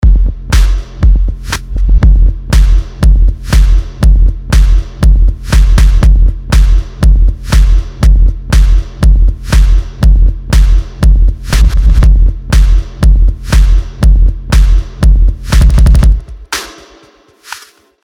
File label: Beat Elements; Arcade; 4am Workout